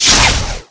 POSSESS HIT; Messiah

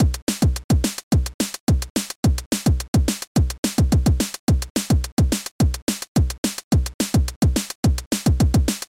rhytm; amos

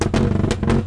pop loop, car

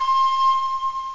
recorder; OctaMED SoundStudio V1; mirage

